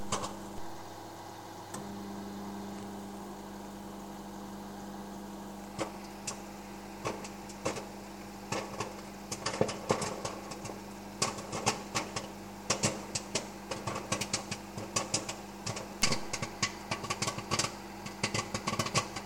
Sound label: popcorn